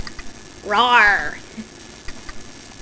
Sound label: rawr